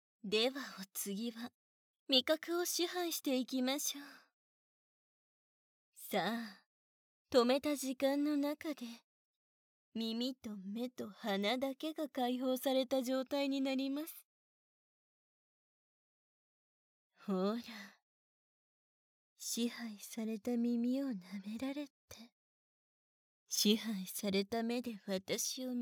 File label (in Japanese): 優しい耳舐めと蕩けるキス; 味覚支配; 効果音なし